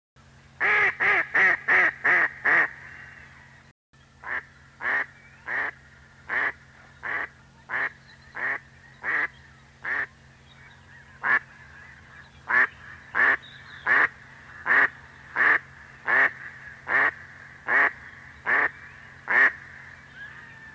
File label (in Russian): kriakva